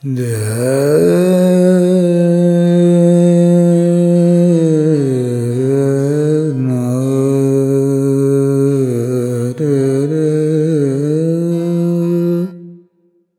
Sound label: Alaap, Vocals Male, World Pop; Sonic Foundry (Sony Creative Software)